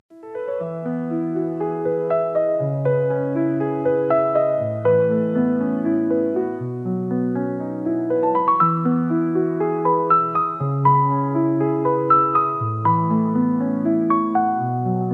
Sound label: silent piano